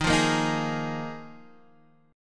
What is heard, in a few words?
Eb Suspended 2nd Suspended 4th, Guitar Half Step Down